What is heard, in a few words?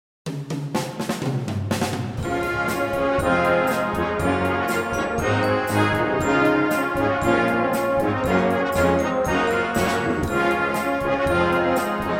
Celtic Rock